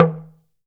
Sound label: TALK DRM, Heart of Africa; Spectrasonics